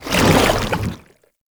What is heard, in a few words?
Goo Layer, Footsteps; Enemies; Foley; Warframe; Lasrian Soldiers; Lasrian Chem Strike Nox